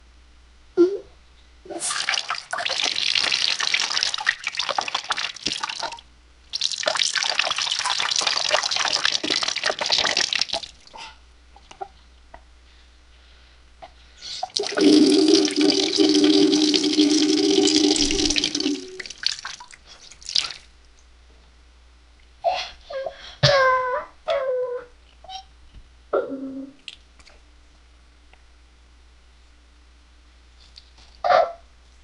extremely powerful diarrh, bathroom

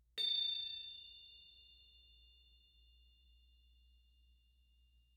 musical instruments

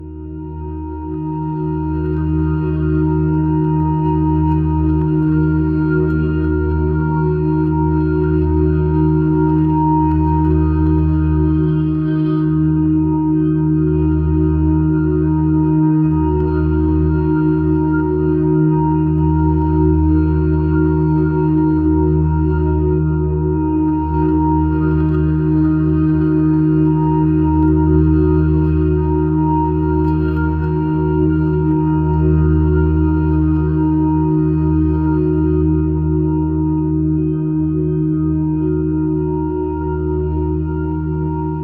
background sound, dreamy

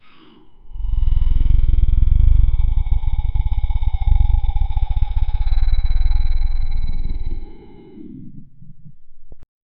grotesque monster breath

